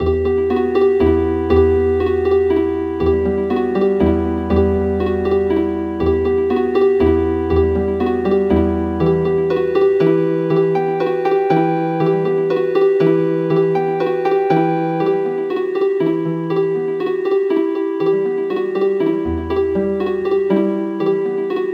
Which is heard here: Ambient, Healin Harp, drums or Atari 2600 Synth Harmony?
Healin Harp